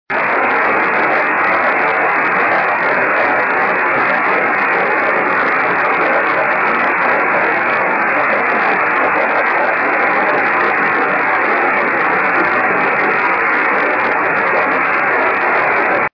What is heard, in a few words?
24G beacon; 雨、気温8℃ 湿度80％; ANT: 60cm dish 受信：ＤＤ対応機 SSB:RST405 レインスキャッタ; JH1UGF 横浜市 → →; JN1AYV 茅ヶ崎市 → →